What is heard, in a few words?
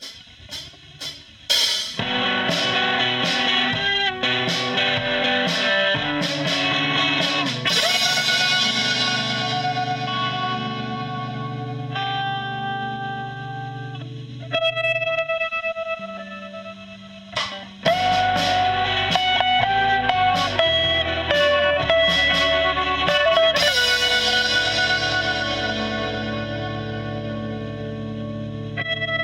tapedust 120bpm